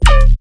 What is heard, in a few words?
BASS ONE SHOT